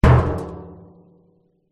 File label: impacts metal oil drum hit hand